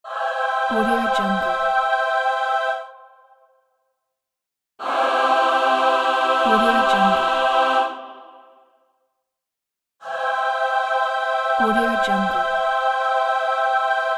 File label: heavenly angels stinger